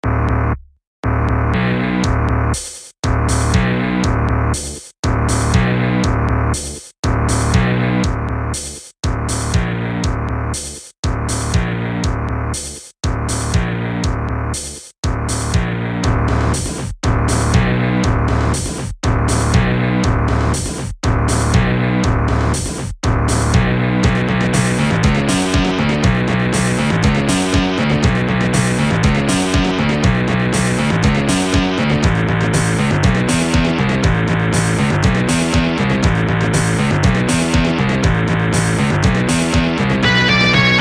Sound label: Fight; Eight Dragons; PS4; Windows; Xbox One